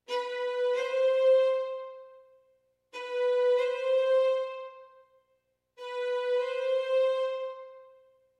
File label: Heel Mid Tip Bow